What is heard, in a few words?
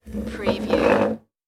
move over chair